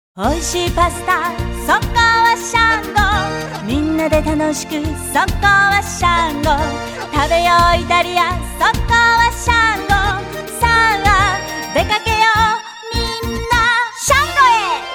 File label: song